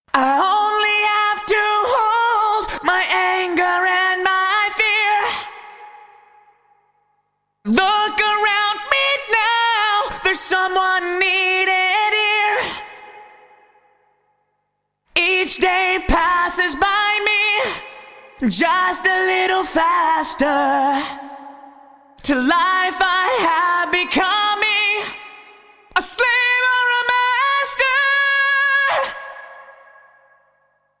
Vocal Roland SRV 330 Stereo 140 Plate Vintage Digital